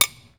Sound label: Hammering, INDUSTRIAL